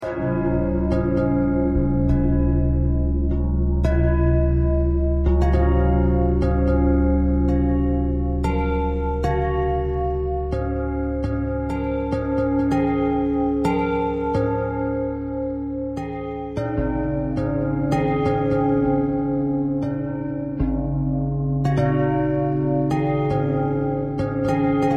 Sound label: bell thread